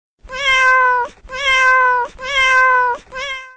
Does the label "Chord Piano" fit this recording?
No